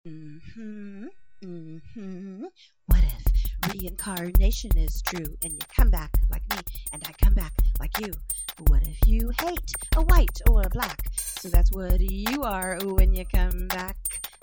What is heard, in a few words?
Rap